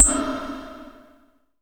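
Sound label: percussion FX, Dance Drums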